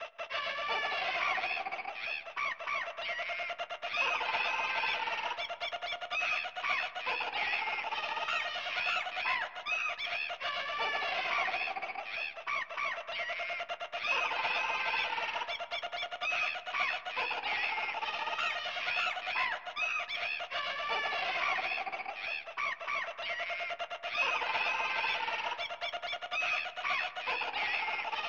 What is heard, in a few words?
Maya Chant; Killer Instinct Gold